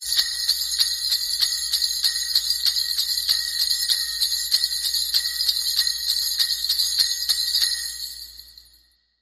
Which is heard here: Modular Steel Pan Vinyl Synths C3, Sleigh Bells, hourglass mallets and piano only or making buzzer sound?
Sleigh Bells